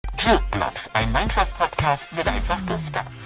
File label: strad; minecraft; records; JukeBlocks Categories Resource Pack